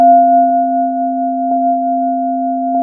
belling C2

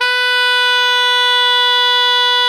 SOP FF B 3, Saxophones; Greytsounds; Philip Wolfe David Crozier's Saxophones

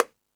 Latin Layers, Drum Machines E-MU Pro-Cussion

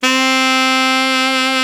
sax alto ff; Alto 414

